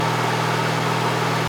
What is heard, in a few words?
Engine Jeep Rpm Low; multiplayer kart racing; boom karts; android